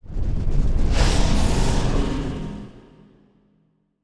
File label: transform